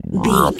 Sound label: frog deep croak